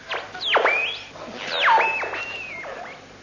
radio tuning

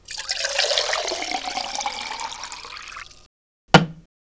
pour mug

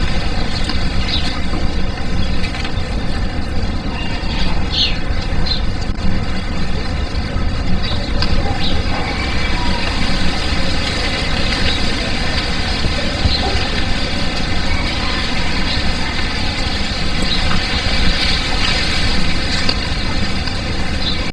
country ambience

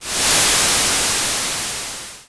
noise

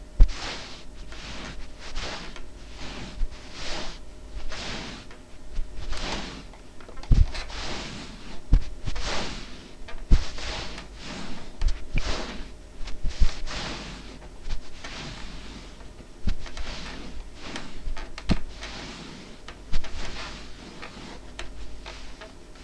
scuffs

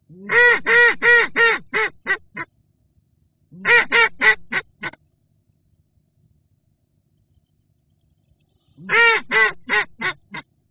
Ducks

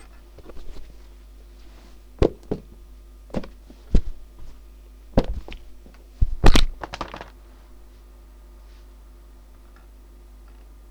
thuds

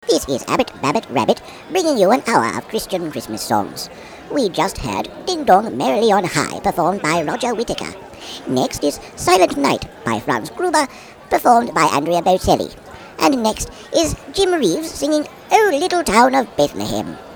chritmas songs

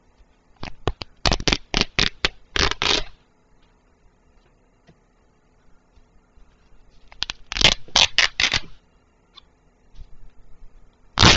tear

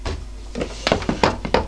chair

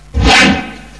whoosh

